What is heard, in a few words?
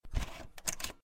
global promos intro; Cars